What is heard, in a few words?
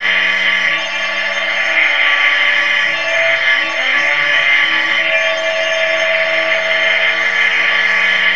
MELOGRAIN L, GRANULAR, Disjointed Textures; USB Soundscan vol.33; AKAI